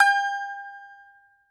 BAGLAMA G0MR; E-MU Producer Series Vol. 7 – Old World Instruments

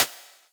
Snares, Elektron MachineDrum SPS1 MKII

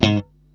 G#2, funky guitar

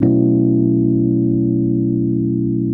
RHODES; Houseworx

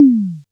Casio SA-10 Tom

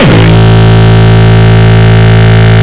Longkick, kicks

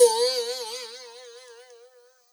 gopi, WORLD PERCUSSION; Best Service ProSamples vol.52 - World Instruments 2; MIXED PERCUSSION

